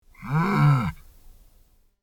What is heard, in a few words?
deer; AnimalDictionary